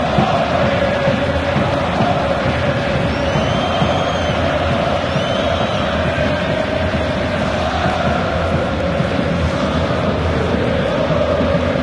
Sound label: background